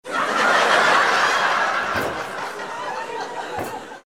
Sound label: laugh table